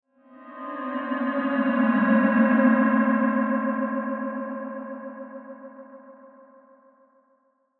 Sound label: Ghost Bend Small; Horror; Ghosts; Blastwave; Sound Effects:Horror